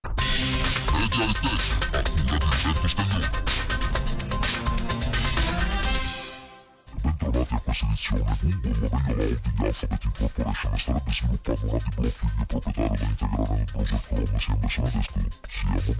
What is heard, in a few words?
Hz